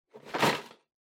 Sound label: Drawing Box Shake